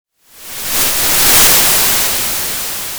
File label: Sound of waves crashing on a shore